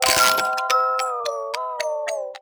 Mini Music Man Try Play Music; Five Nights at Freddy's; FNAF Security Breach Ruin DLC; PS4 PS5 Windows gamerip 2023

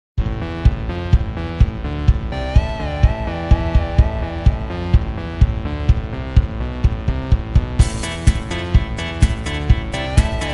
Level Track; block breaker 3

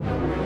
Dramatic Start 64bpm; asterix obelix slap them all 2; Music Dialogue

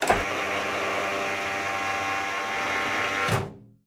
Door First

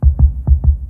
Heart Beat fast; Coprs HeartBeat fast

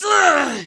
Air Bail Male

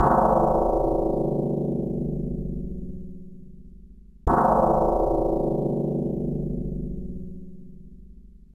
Boss Gong; Monkey King Master of the Clouds

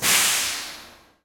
Capsulte Explose Gaz

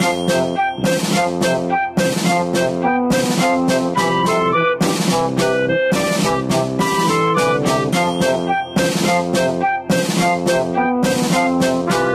Baseball Fanfare; Ushiro

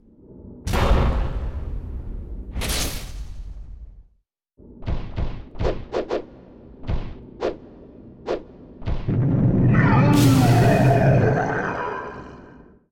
Appear

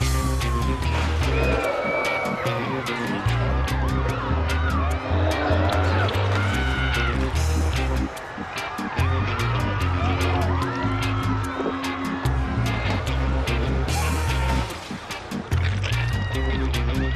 Loading

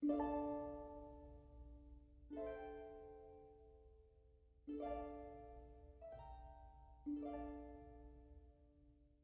harp

